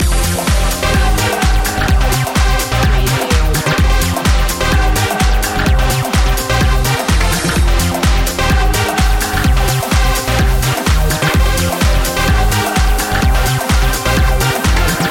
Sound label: beat loop